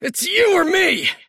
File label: attack pain; davion; dota